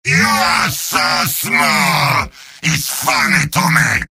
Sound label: yell; heavy; video games; source engine; tf; mvm